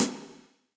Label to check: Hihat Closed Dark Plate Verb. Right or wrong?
Right